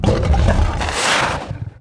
Chimaera yes attack

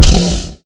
zombie horse hurt; Minecraft

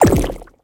dry fire

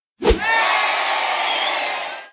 Wizard Rabbit Thumbsup; Emote

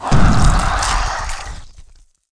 Gargoyle death

